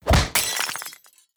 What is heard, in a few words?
leader card destroy; Animation Throwdown; cardbabies